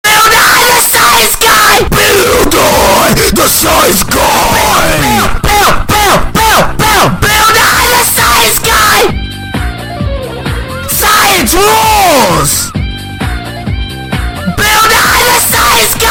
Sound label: screaming; bill nye